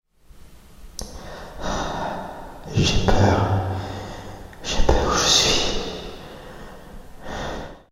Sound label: voice fear, prison cell